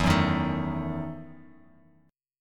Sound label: E Major 7th Suspended 2nd Suspended 4th, Bass Standard; EM7sus2sus4 strummed